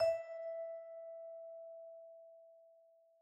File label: Scale; Second Scale5; tintin reporter cigars of the pharaoh